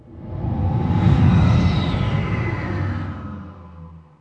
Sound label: TurboLift; Babylon 5